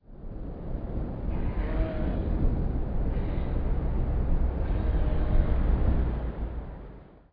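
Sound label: desert ambience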